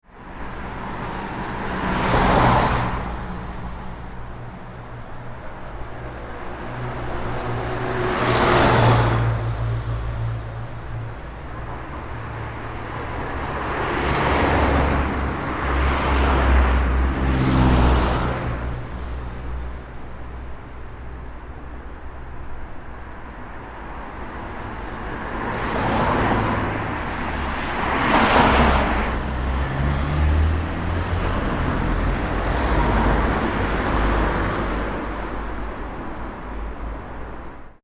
ambience urban traffic main road med quiet dry, Traffic Urban Dry Main Road (40Mph); Traffic Ambience On Urban Main Road - Medium Quiet At Aprox 40 Mph; Traffic and Cars; Backgrounds